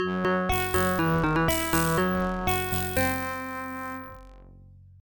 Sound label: Battle Draw; Dyna Blaster; ibm pc at